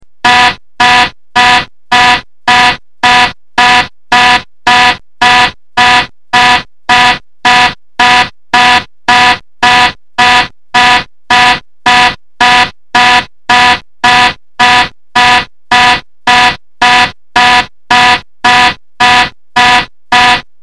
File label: configuration warning classic boeing